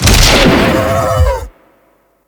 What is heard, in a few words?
gambling lose; DinoReels81WD; wazdan